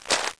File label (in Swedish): skog; skogsljud; aomdc